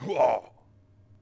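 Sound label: Swordsman Fort Vox; Civ4 warlords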